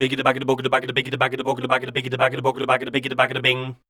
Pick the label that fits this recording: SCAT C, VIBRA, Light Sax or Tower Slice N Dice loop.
SCAT C